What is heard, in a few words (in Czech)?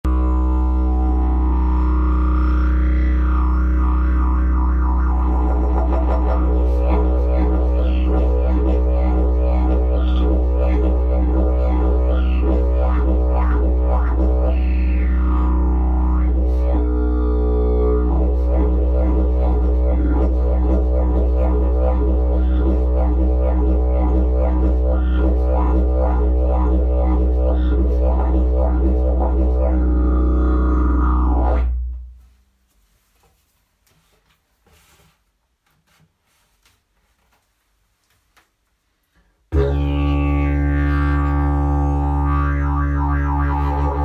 koncertni teleskopicke didgeridoo